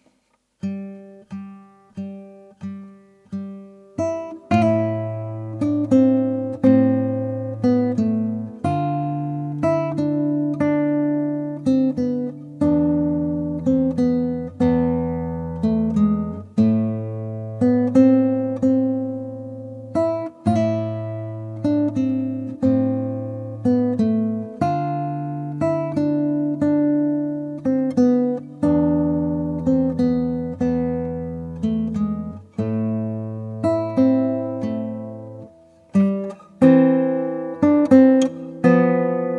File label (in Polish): siciliana, gitara; M. Carcassi